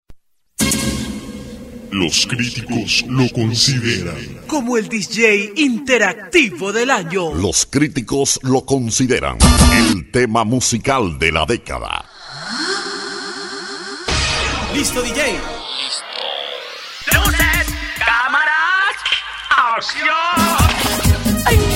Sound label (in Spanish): merengue mix